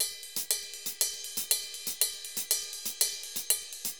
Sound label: RIDE, DRUMS SAMBA; Best Service ProSamples vol.14; AKAI